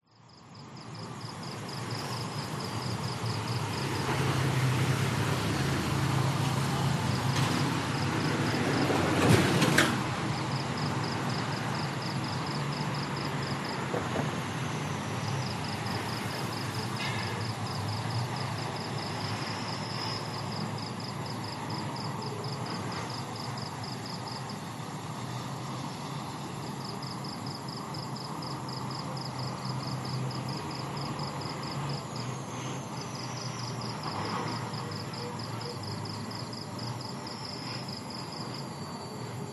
industrial construction distant digger exhaust bangs occ vehicle pass night insects